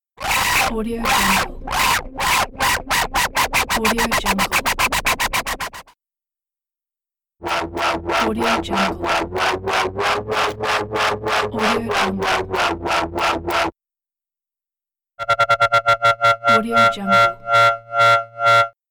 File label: oscillator wobbling sound effects